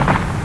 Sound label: Barrier Gun Fire Regular; Barrack Unity